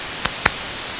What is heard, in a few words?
clap noise; claps